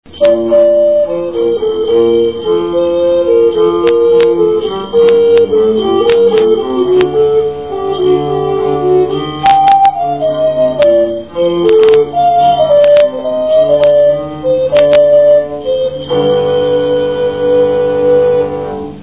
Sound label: cymbal